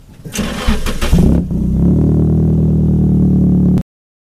240sx sr20de start up

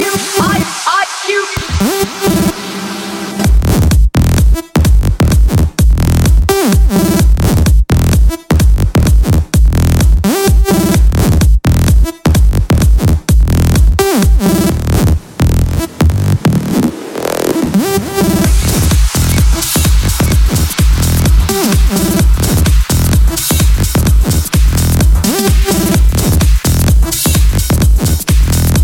bass

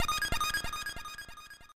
Reverb Tone

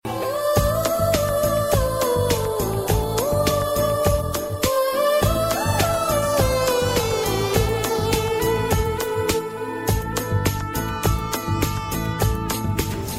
rhythm new theme

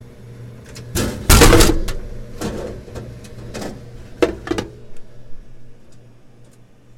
Vending Machine

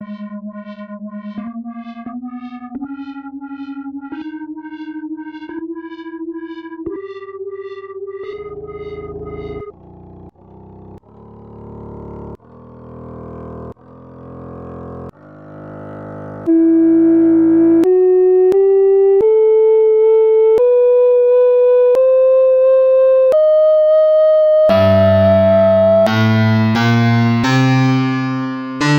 F flat Melodic Minor

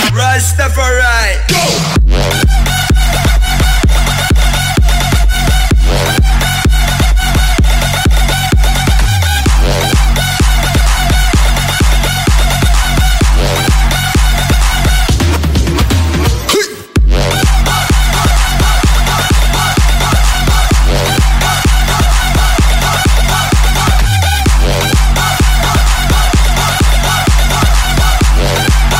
EDM

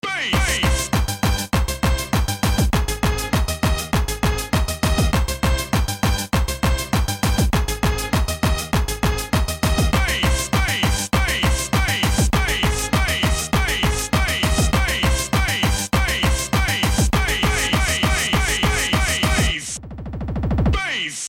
Old skool sample

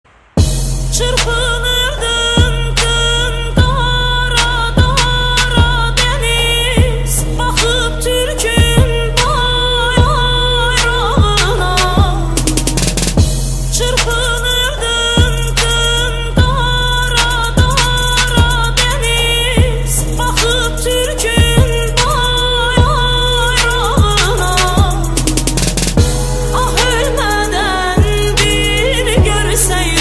Karadeniz Trap

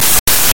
Laugh Witch

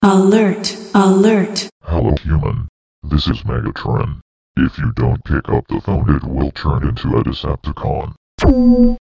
flute mix instrument

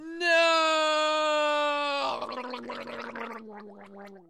NOOOOOOO glglglgl

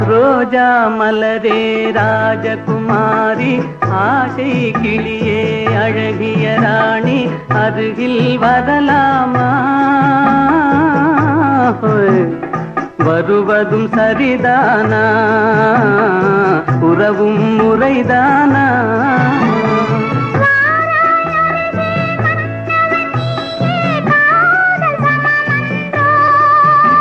old tamil song; ringtones; sms

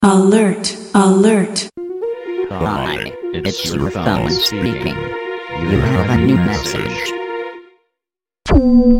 scary tune, ringtone

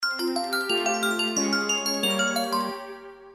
soft message tone

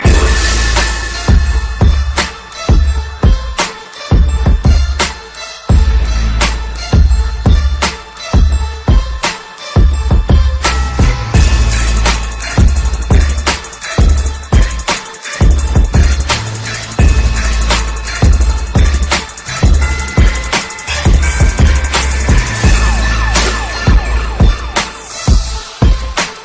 gangsta beat; hip hop

